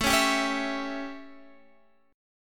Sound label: A 7th Guitar Standard, chord; strummed; JGuitar